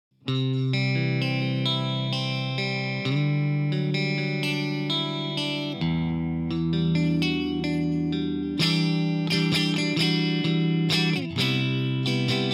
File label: pick compare clean